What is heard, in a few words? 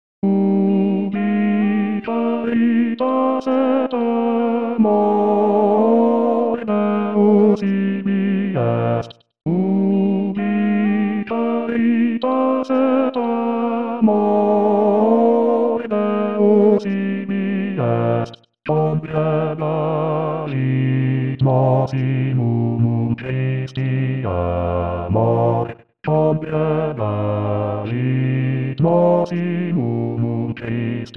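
bass 2; Durufle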